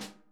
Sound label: snare tap; Sonic Reality; Interactive Drum Kits